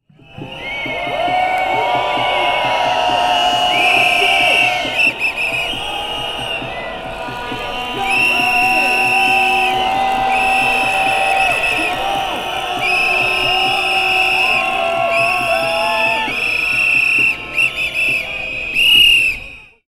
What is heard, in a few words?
street protest sound effect